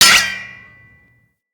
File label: Parry Bullet; Weapons